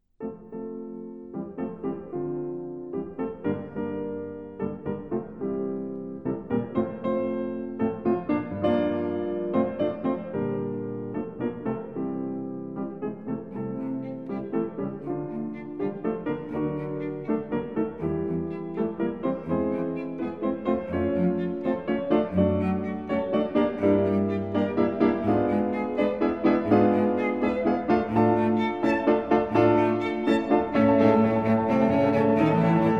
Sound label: piano trio; volkmann